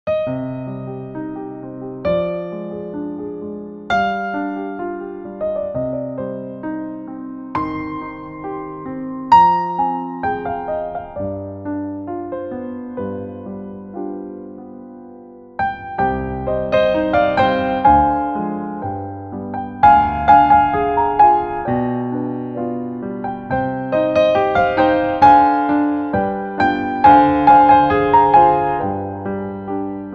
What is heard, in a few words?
piano